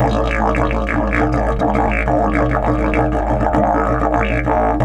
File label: DIDGE 100BPM; Big Fish Audio - Didgeridoo and Other Primitive Instruments